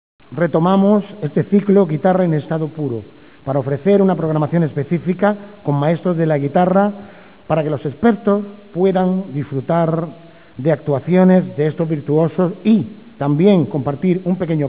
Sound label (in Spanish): Guitarras en estado puro